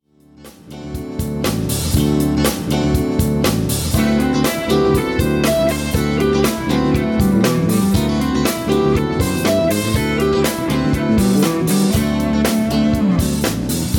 Fretboard Navigations, Guitar Techniques; Guitar Techniques 2019 Issue 290 January

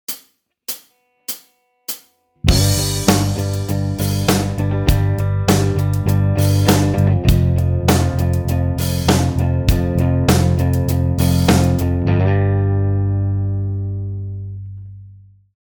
Slide backing; Guitar Techniques 2018 Issue 288 November

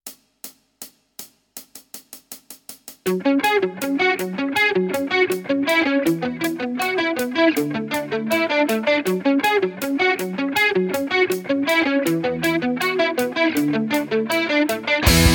Rock; Guitar Techniques 2019 Issue 296 June

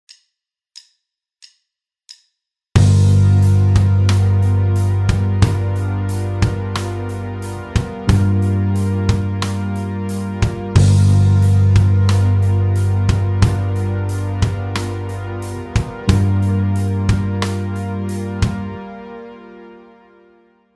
backing; Guitar Techniques 2018 Issue 288 November

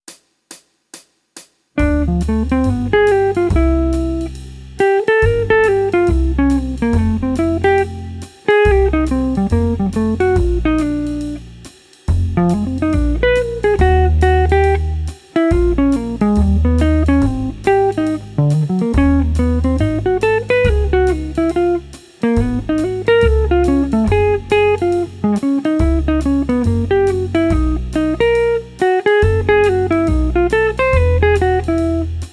Jazz, Guitar; Guitar Techniques 2019 Issue 294 Spring

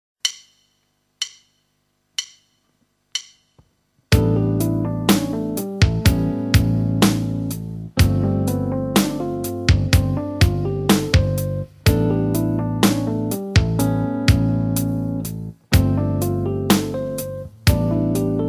Chord Camp backing, Guitar; Guitar Techniques 2017 Issue 266 March

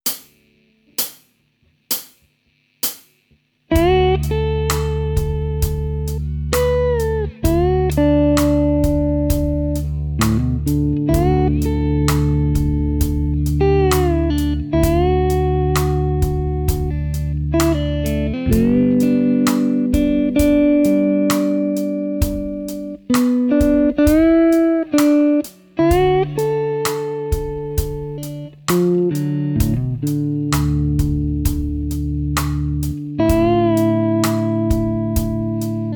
Slide Jam Track, guitar; Guitar Techniques 2018 Issue 289 December

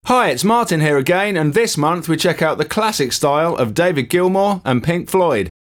Rock intro, Guitar; Guitar Techniques 2019 Issue 297 July

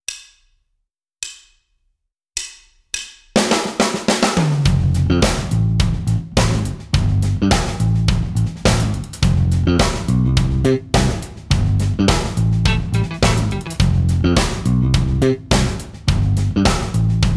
Rhythm Guitar

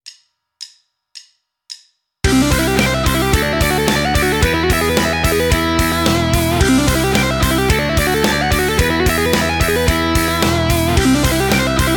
Rockschool, Guitar; Guitar Techniques 2019 Issue 297 July

